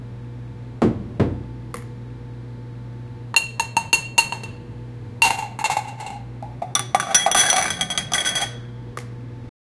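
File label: café sounds as music grind, grinder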